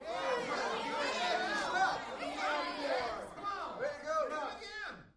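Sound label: Crowd 15 Interior Rowdy; small int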